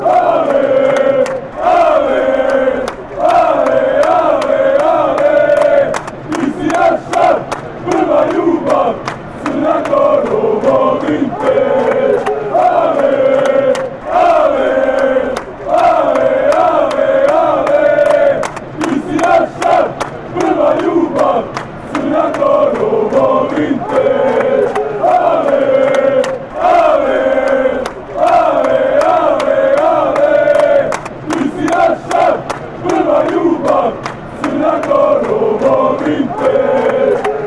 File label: montenegro chant